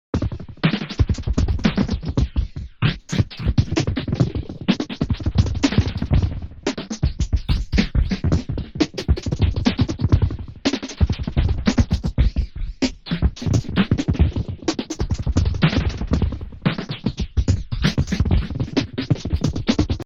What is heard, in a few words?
delay mod